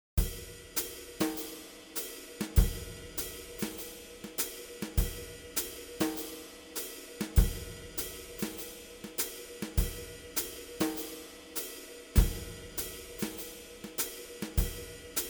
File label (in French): batterie